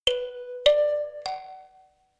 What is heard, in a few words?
notification kalimba rattle triad up minor high